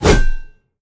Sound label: sm swing; smite; gilgamesh